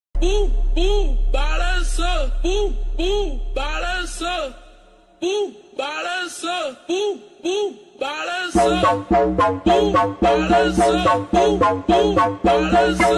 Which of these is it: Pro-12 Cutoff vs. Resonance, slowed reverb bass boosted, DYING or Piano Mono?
slowed reverb bass boosted